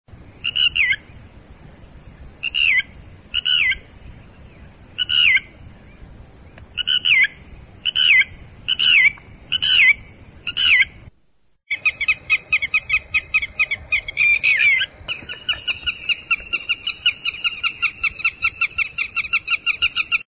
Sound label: red wattled lapwing